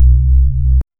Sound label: a soft ominous powerup so, bass heavy